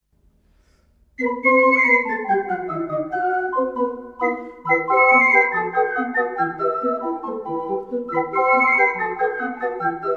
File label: Marches, ocarina